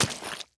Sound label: meat, player steps; quake2